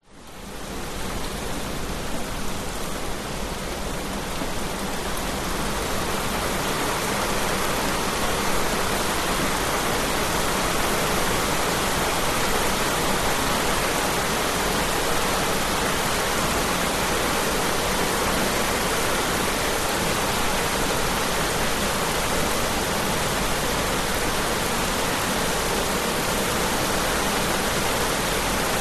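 rain heavy diffused on rice fields and concrete bali indonesia; lukas tvrdon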